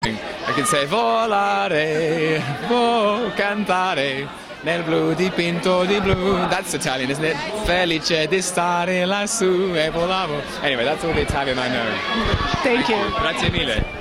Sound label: singing